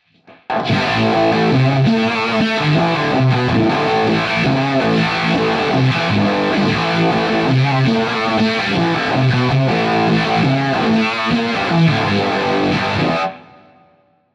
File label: phaser